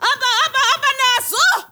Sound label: FEMALE RAVE; Spectrasonics; Heart of Africa